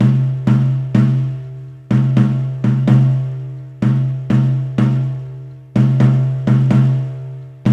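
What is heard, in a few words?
125 bpm, daiko ensemble; Spectrasonics; Supreme Beats; World Dance; Asian Daiko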